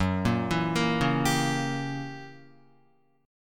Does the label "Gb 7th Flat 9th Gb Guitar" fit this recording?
Yes